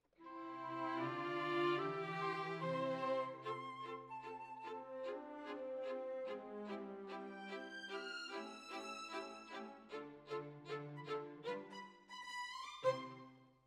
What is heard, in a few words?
Violins, jazz for piano